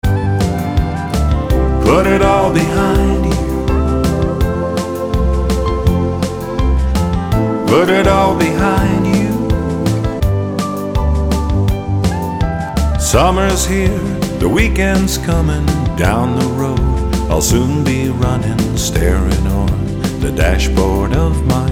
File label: Western Slope Trailer Jingle